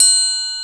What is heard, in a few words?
Open Triangle; Proteus 2000; Digital Sound Factory Proteus 2000